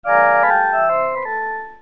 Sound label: jingle; goblin